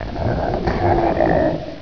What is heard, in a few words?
bullchicken die